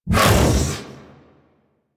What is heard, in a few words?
Iron Dodge Burst; Warframe; Warframes